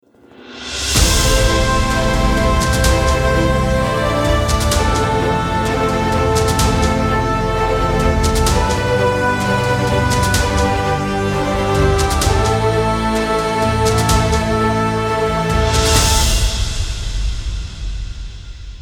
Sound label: cinematic logo ident